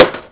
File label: takestick hit; weapons